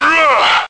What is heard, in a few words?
stun; slender; chaosinsugunner